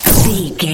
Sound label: sci-fi weapon blaster laser boom heavy